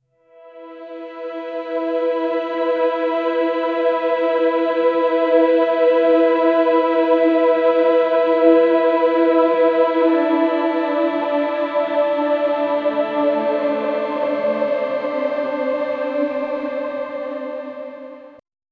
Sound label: a slow strings